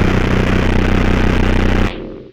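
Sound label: RUMBLE right, Hard & Loud Techno; USB Soundscan vol.01; AKAI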